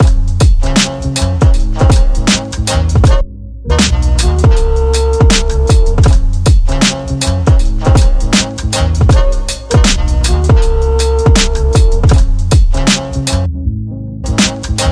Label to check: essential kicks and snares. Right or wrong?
Right